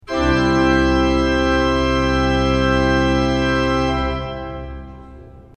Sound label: tutti II; srednja vas